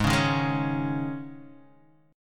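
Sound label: E flat 7th Suspended 4th, A flat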